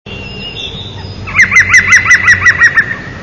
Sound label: picus viridis